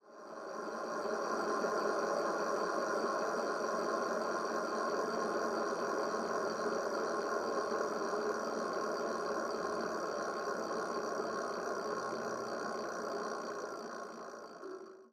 Bike Contact Mic, Transportation; Nikko Barrera-Amaya

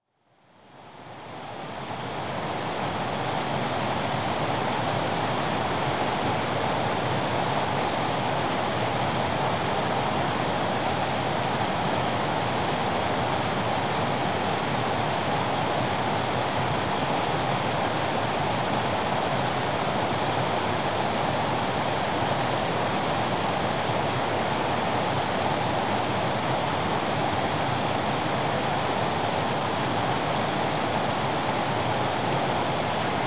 Rivers And Streams, Waterfall; BBC Sound Effects Library

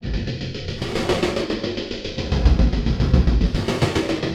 Loop 110 bpm, Stretchmangled; 99Sounds; Mangling Audio

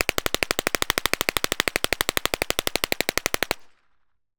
Full Auto Mag Dump Incoming; WatsonWu

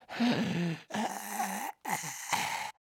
Test Subject, Zombie; Zombie Specimens Vol 2

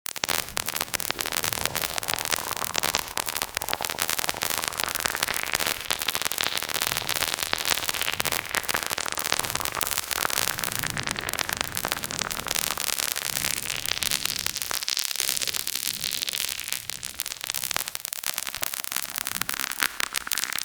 Weird, Dark Textures And Digital Glitches; Total Kheops; Dark Textures And Digital Glitches 3

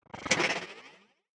Tech, Weird Side Samples

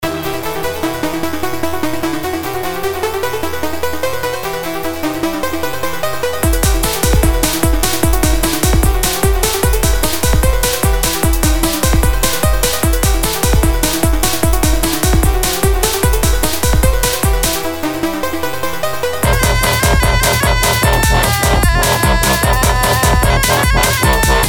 Temple Electric

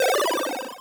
Creature Birds, 8-Bit; Rogue Waves; 8-Bit Legend

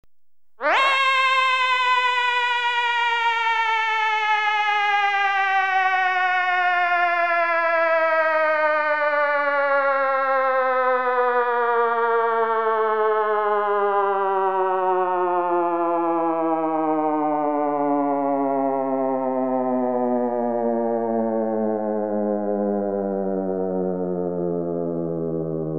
Descending Siren, Electronically Generated Sounds; BBC Sound Effects Library

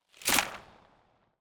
PARTICLES WHOOSH Arrow; SoundFxwizard; PARTICLES An Organic & Granular Journey

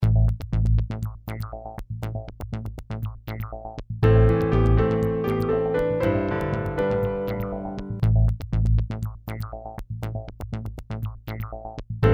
120 Monster, Electro-Acoustic

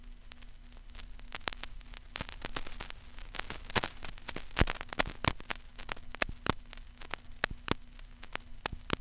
vinyl crackle; 99Sounds; Vinyl Noise SFX

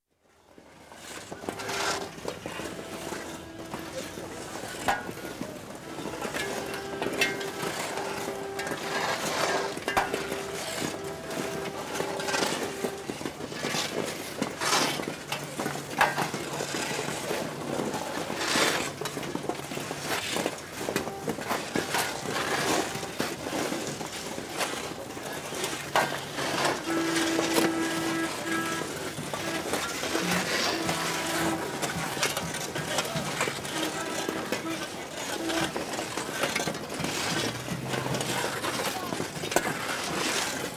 Clearing Away Ice In Street, China; Chan; BBC Sound Effects Library

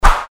Fighting Swing Sound; FXHome

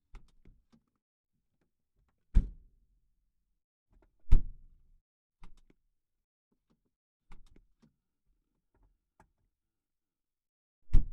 Ferrari, 308GTB, Door, Various, Interior, Left; 1985; DPA4061; Pole Position

